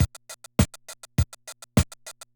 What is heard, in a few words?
Loop, classic machines, rpm 40